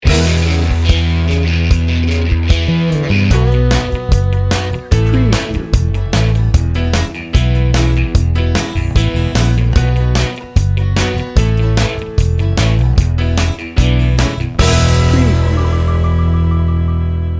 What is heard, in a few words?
country bar